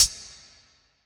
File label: Hit, Percussionz